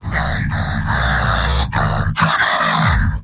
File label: singing; heavy; mvm